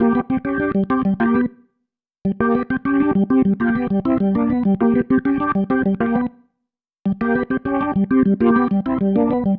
Pattern, Organ; Sonic Foundry (Sony Creative Software); Joe Vitale Organ Donor

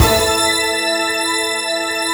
BIG CHORD, SYNTHESIZER STACK; AKAI S6000 CD-ROM - Volume 1

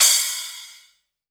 CYMB, 180 BPM; Ueberschall; Drum & Bass Resonance

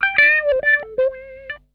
HIDE N SEEK, Funk Guitar; 90 s Sample CDs; Zero G

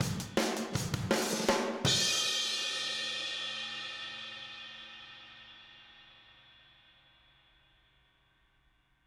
Outro Room M, 162 BPM, Drums; Drums from the Big Room The Mixes; Take Your Toms Off

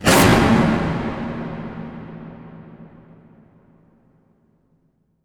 Impact Wipe, Cinematic

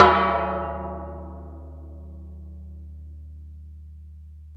BIG LID, METAL PERC; Zero-G Ambient 1

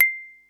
mallets

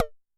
TEKK 2H, Tom, Yamaha DTXpress-II

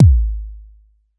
kick machine

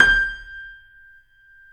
C7; East West; The Ultimate Piano Collection

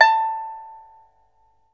PIPA A6; PIPA TREMOLO; Spectrasonics; Heart of Asia

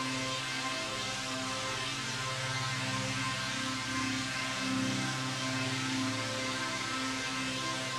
Drone; stereo toolkit samples; Non Tempo Loops

